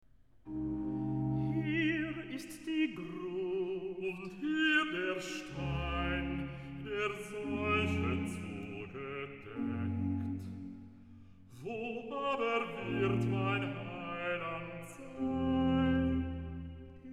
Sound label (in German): Recitativo (ATB); Bach, J S; Easter Oratorio; Oster-Oratorium, BWV 249; Hier ist die Gruft